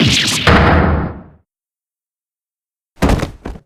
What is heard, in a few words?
faint; rayfa